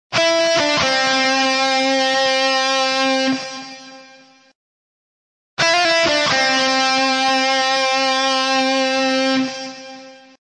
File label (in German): Glossar triller